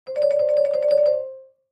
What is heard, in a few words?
Clavichord Trill; keyboards